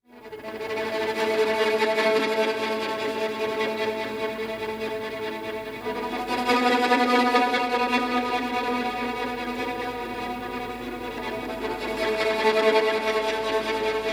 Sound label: Shimmering String Drone; Nordic Drama Drones